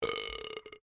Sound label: MALE BURP MEDIUM; Male burping; Human